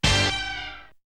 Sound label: Hit; ZERO G - Datafile DISC 1